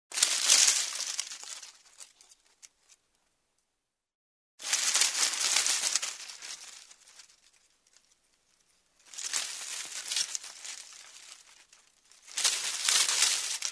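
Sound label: rustling dry leaves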